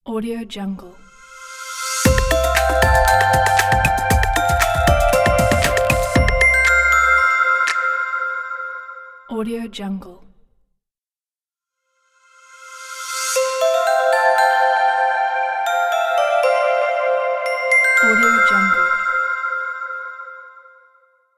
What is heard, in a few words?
crystal corporate logo ident; terrasseproduction